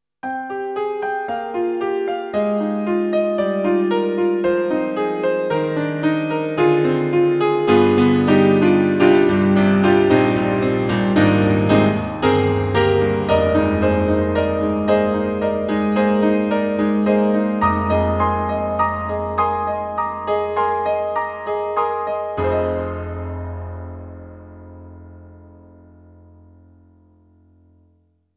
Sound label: Nice Piano; Roland; XV-5050